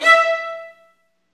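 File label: STR, Roland String Master Series, Violins Marcato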